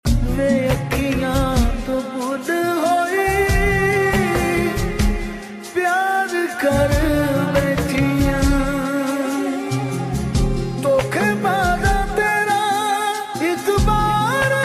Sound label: Sad Song